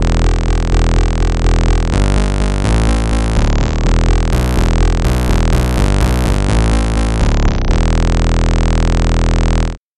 bass lead bass

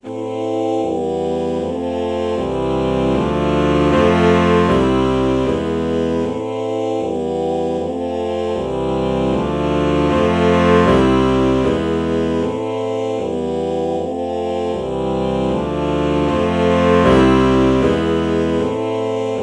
alto saxophone oh chord morph

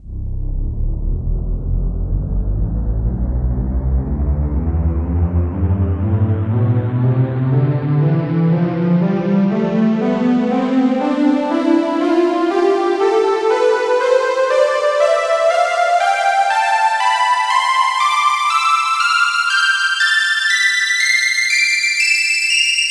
halo pad; tonleiter

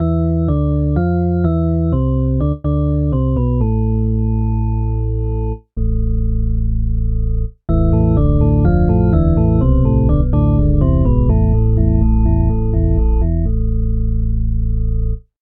drawbar organ

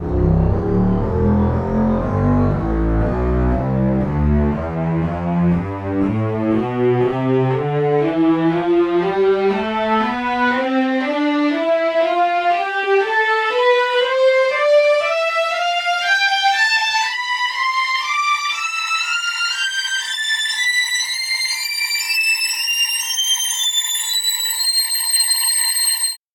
slow strings tonleiter

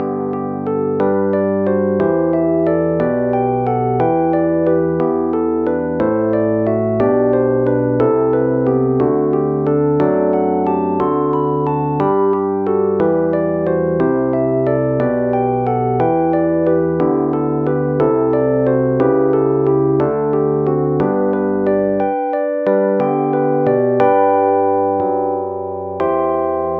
rhodes ep; SpectMorph Evaluation #4

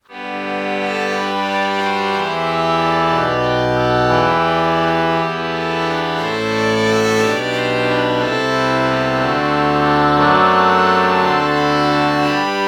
oboe violin morph

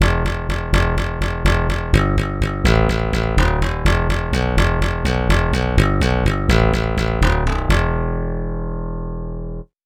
pop bass

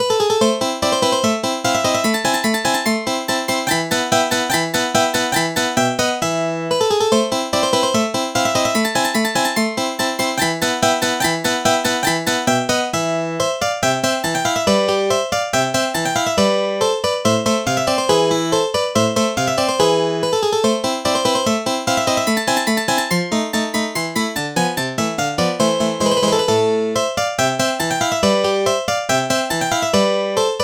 steel string guitar

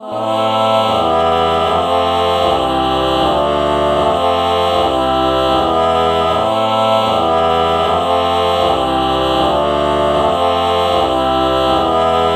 ah ah chord morph

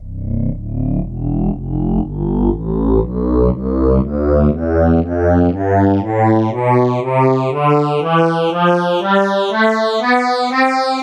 accordian tonleiter

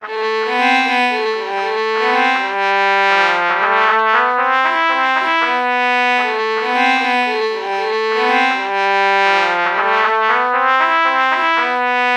trumpet violin melody morph low

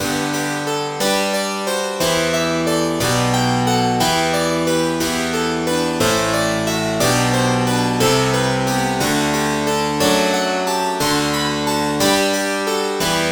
harpsichord; SpectMorph Evaluation #4

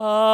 ah ah melody morph low

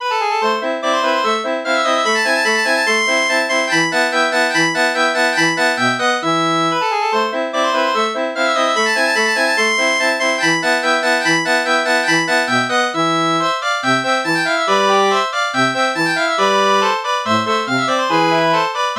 reed organ

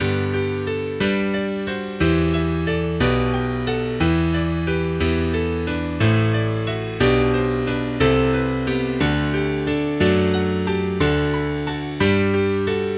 bright yamaha grand

